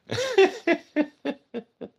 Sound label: hihihi